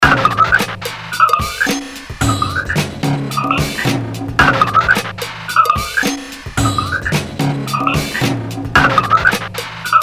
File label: extremely abrasive beats